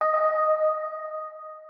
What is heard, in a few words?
organ